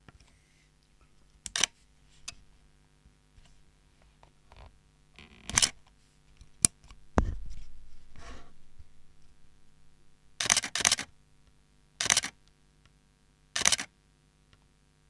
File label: Camera Shutter